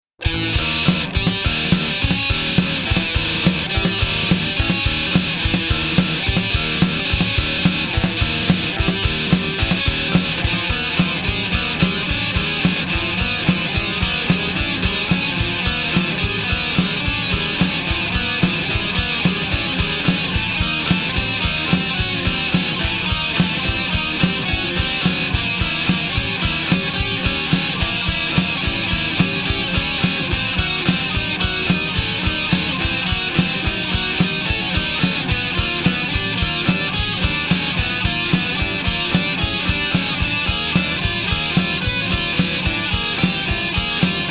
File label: instrumental